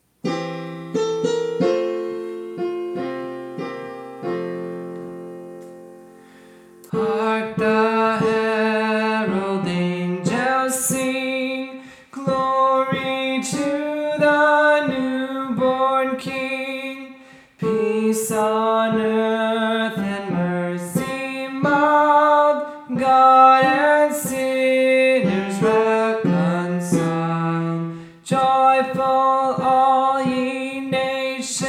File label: Tenor